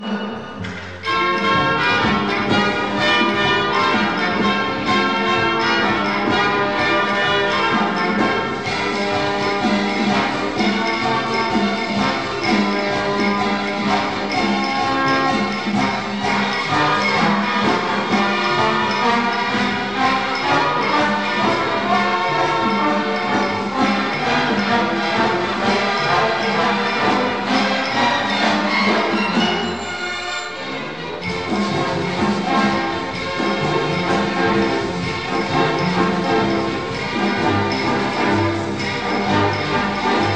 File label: el compadre merengue